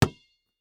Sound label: Sports Basketball Bouncing Cement Floor